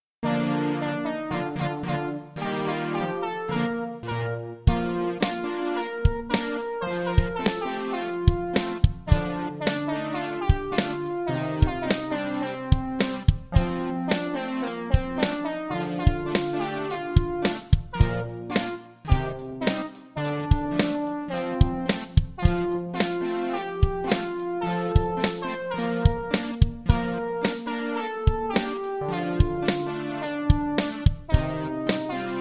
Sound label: sch midi